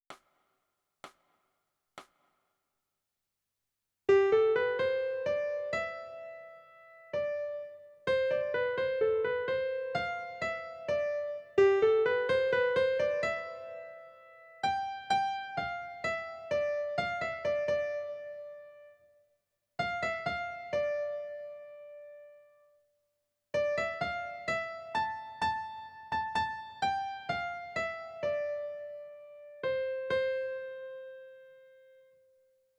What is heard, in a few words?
Melody